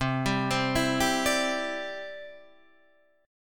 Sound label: C Major Add 9th, Guitar, Standard; arpeggiated; JGuitar